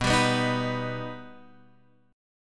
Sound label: B Augmented B down Guitar Open F; strummed; JGuitar